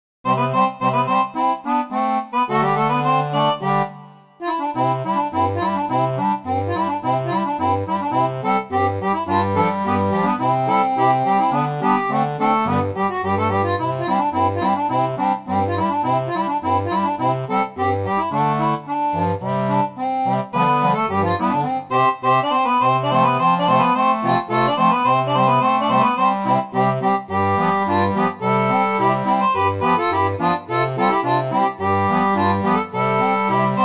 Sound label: seraphone